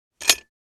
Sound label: Metal fire extinguisher trigger